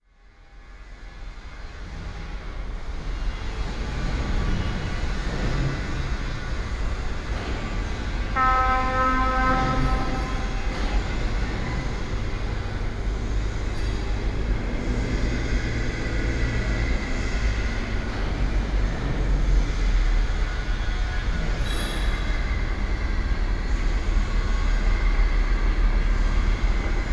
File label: concrete factory area, sergiyev posad